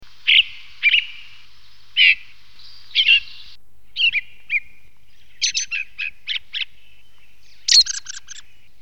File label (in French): merle